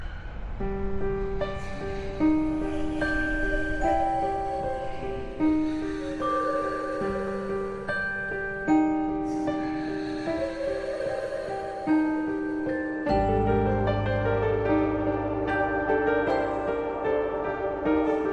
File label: Harmony